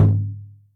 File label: Taiko, Percussion, Yamaha DTXpress